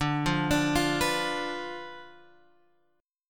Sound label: D-Minor 6th, D, Guitar, Open G